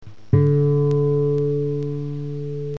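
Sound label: nylon re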